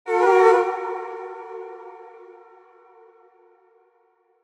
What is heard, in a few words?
Chant, Vocals